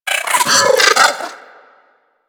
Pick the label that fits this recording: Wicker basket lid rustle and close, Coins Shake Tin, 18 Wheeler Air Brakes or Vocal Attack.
Vocal Attack